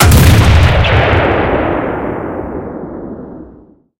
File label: cannon 120mm shot, cannons tanks